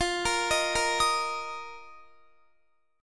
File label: F 7th Suspended 4th, Guitar; F7sus4; 4E,4A,5D,5G,5C,6F; arpeggiated